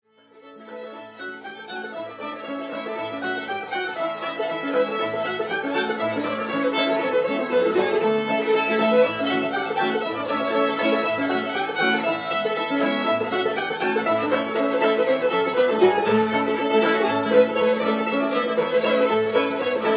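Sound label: Fiddle, Banjo, Uke, Guitar, Mandolin; Old Joe Clark; Old-Time JAM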